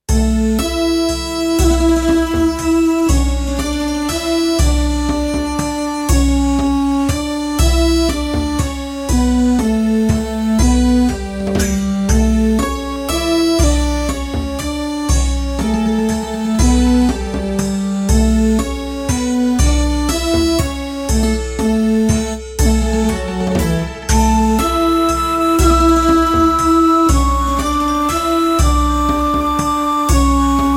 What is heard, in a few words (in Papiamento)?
Fragmento Musical di Grecia